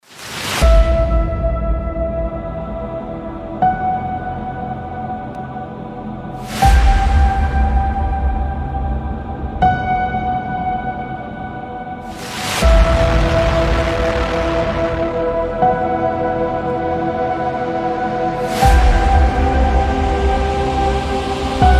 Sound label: saz